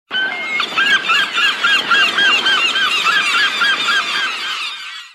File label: SEA